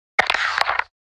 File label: CAMERA SHUTTER